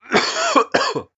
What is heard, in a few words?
Young man Asthma Attack